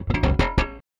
Rickenbacker Bass; Airwindows; Rick-Xtras

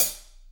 HH IH

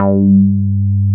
SH101; OLD ROLNDS

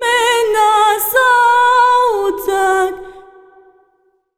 SINGER, ArabianTraditions

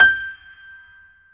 PIA G 6; Best Service Gigapack 1

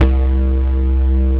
bass, 135 BPM, drum & bass; Ueberschall; Drum & Bass Flash Trackz

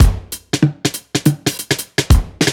Heavy Swing 95 D, 95bpm; off the grid

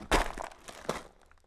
Rocky Step, outdoor, Footsteps

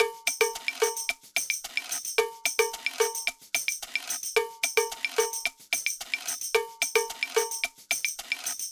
Ensemble, Latin Percussion

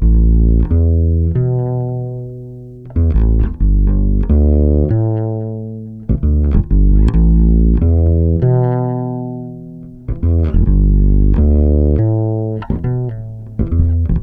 WALK, Bass Grooves; USB Soundscan vol.30; AKAI

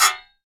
Guiro Short, Percussion; Roland BK-5

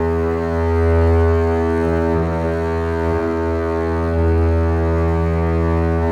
Contrabasses E1

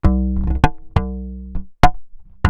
DRUMLOOPS, Korg; Back In Time Records; Korg Universe VOL-1

